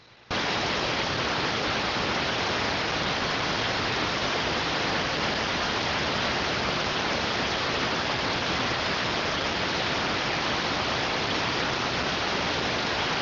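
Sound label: radioteletype receive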